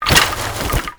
Bunraku Puppet Movement; Warframe; Koumei

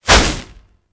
Fist Whoosh; Warframe; Mummy

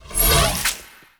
Launch Shroom Proj Whiz By; Warframe; Nokko; Launch Mushroom

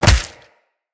Melee Fist Impact Flesh

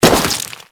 Crewman Step Flesh, Footsteps; Warframe; Corpus

